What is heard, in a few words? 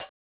hihat